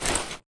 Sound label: Grunt Mvmt FOL Soft Short; Titanfall 2